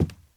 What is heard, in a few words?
carpet step; Golden Crayon Sounds